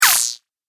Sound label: Future Electric Needle Shot